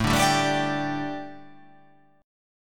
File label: A 7th Suspended 4th, A, down